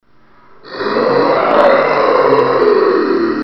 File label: zombie; dod